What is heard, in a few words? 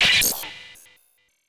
reesey sfx, Perc